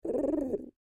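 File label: Creature Oodle Bird Call Light Low; Call Outs, Caw; BigRoomSound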